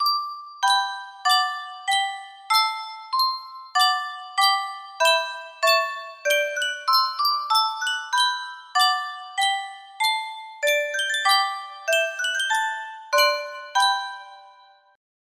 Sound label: yunsheng spieluhr, music box melody; mozart eine kleine nachtmusik